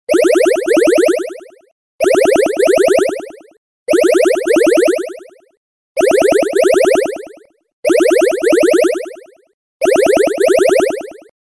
ufo ringtone

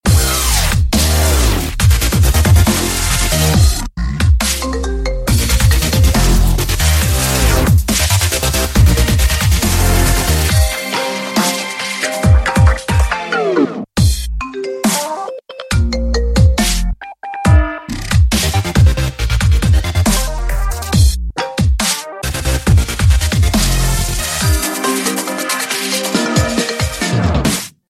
iphone ringtone

